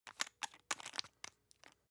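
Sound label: toothpaste close loose, Bathroom; haus-garten; badezimmer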